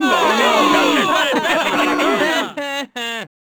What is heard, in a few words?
foul, Crowd